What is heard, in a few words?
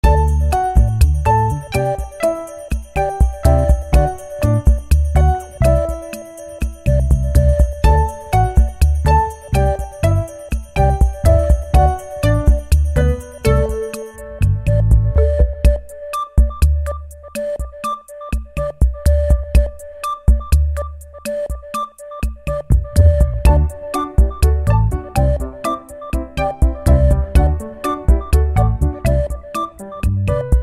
background music ringtone; bro movie; pawan kalyan; sai tej; trivikram; samuthirakani